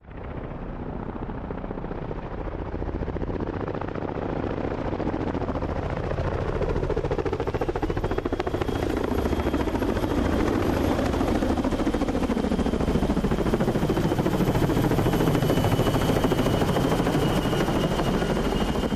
CH-46 Sea Knight flyby, Two CH-46 Sea Knight helicopter